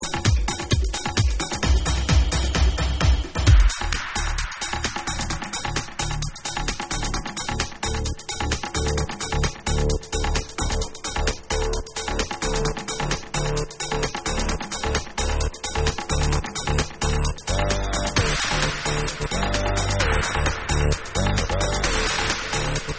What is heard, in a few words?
Tribal